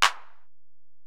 EPerc, Alesis DM-PRO; 300 Drum Machines

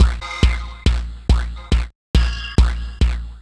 Four Behind Beat, Drum N Bass; Sonic Foundry (Sony Creative Software); Knutrix Drum & Bass

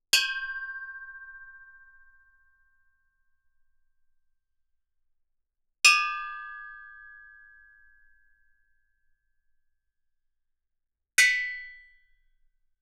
Gamelan; Propeller Island - Complete Gamelan

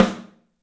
STEELS, 3D Drums, ROOM SINGLE

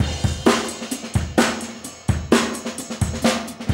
Loop, Roland; Sound & Vision - Gigapack I

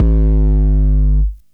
SUB HARD A, SUB BASS; Explosive Jungle; USB Soundscan vol.05; AKAI

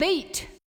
Beat, Yamaha DJX 2B

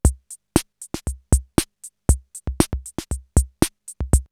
CR78 BeatBox, drumloops, DrumMachines; X-Static Goldmine

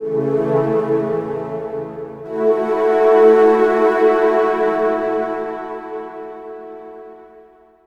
Orchestralic, Pads

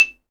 XYLOPHONE, Percussion; ILIO

